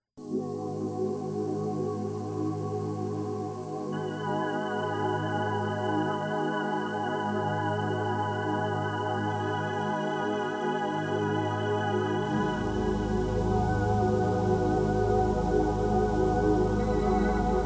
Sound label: Ab soft drone